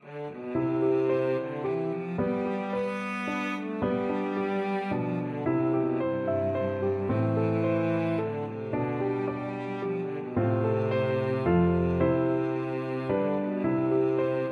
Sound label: cello